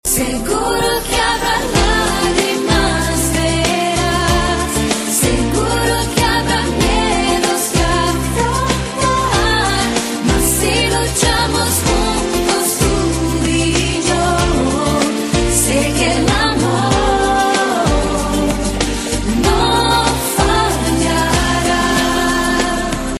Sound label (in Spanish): cancion en espanol tono de llamada